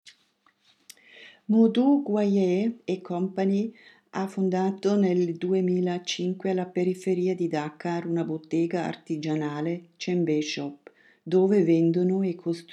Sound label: djembe